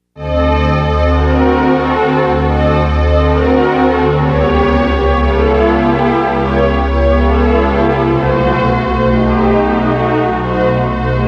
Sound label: Progression, chord progressions